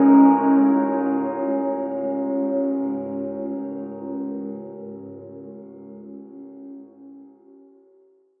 Ambiguous Bell; Bells

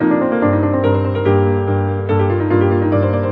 144 fast two rhythm piano melody; KEYS ORGAN PIANO LOOPS

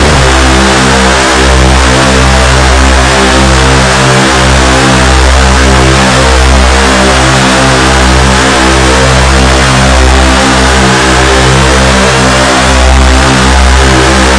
chainsaw, Synth

